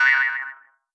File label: baby jump, jumping slime; edgar; enemy